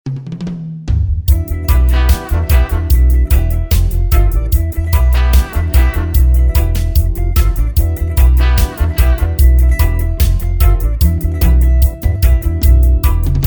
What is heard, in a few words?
Instrumental Jazz Mix